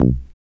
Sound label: dance bass; Sound & Vision - Gigapack II CD 1